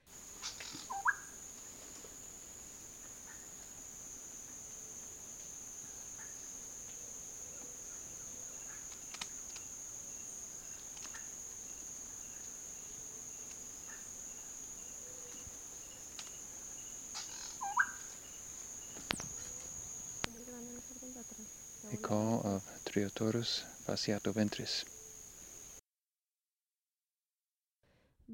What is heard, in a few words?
Black bellied Wren Pheugopedius fasciatoventris